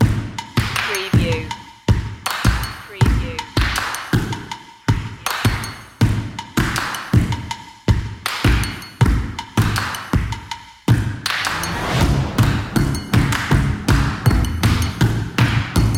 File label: driving fun drums bass only